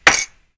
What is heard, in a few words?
mug; Hbm's Nuclear Tech